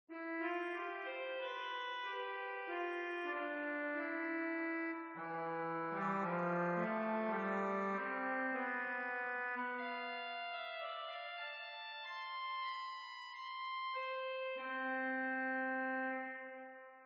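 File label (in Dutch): schalmey 8, orgel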